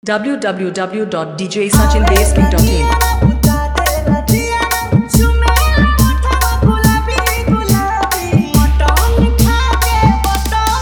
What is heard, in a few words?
New Trending Viral Bhojpuri Dj Remix Song; Hard Dholki Vibration Mixx